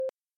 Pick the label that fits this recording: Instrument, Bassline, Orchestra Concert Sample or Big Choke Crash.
Instrument